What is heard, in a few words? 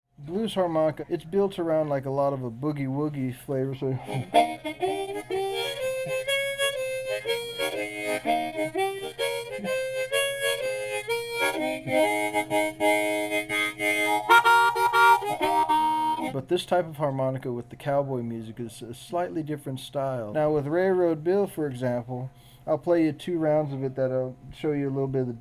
Harmonica